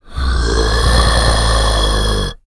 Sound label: GROWL, VOCAL FX; Spectrasonics Vocal Planet; Jazz FX